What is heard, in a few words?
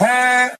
"huh"